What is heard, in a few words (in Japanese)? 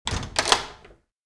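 door kagi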